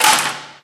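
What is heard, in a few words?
physics clipboard impact medium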